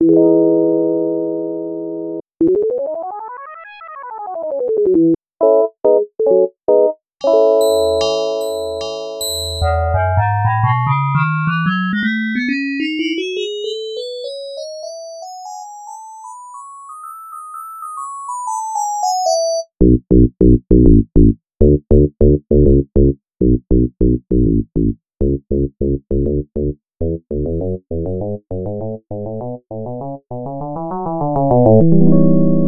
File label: FM synth; jwp homage line